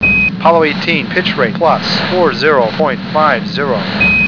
PITCH

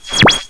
space; Blade

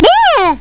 daah!!; Bikaka 1.4

